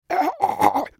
dying; Fiddlesticks

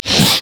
wraith effort; LoL; monsters

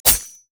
crescent slash; LoL; akali

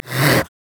lizard effort; LoL; monsters

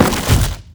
sightward tree; LoL; items

